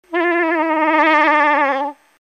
Cow In Heat Short Call, Moose

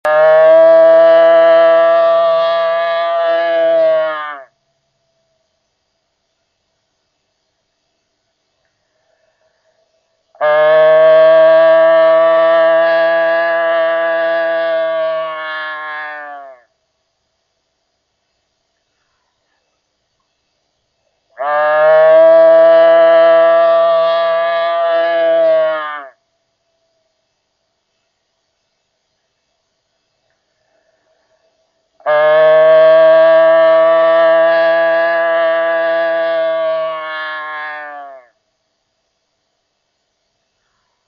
Doe Bleat, Deer

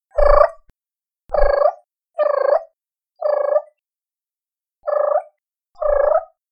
Turkey Purr; ORION

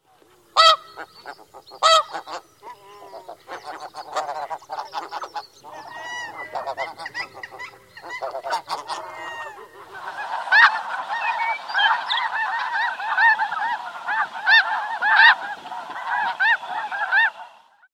Snow Goose Feeding

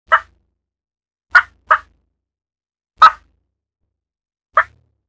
Turkey Cluck

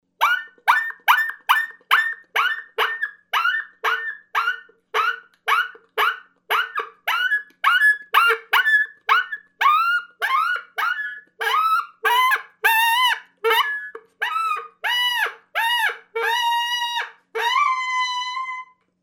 Coyote Distress; Dave Kelso

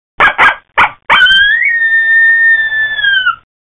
Coyote Greeting

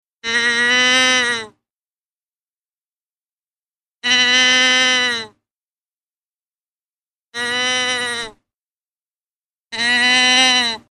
Doe Bleats, Deer; ORION

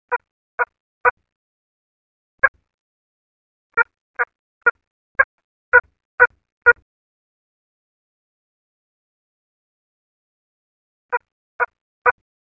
Hot Turkey Soft Hen

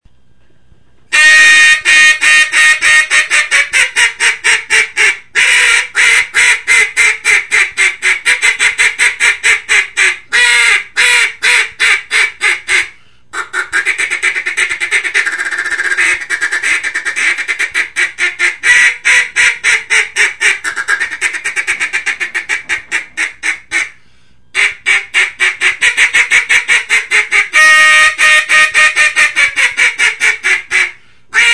Mallard Duck Molded Single Reed Duck Call

